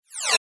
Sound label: multimedia max min interface sound sci fi min; Multimedia Interface ' Minimise ' Sound ( Sci - Fi Style Tone ); Sound Design; McKinneySound